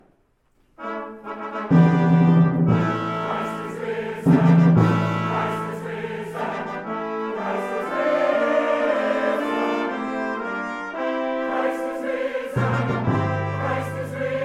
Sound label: Easter Fanfare